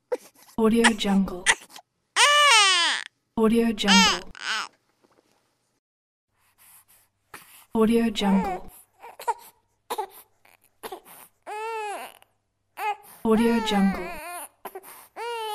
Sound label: crying baby